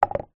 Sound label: foley brick piece small set down on wood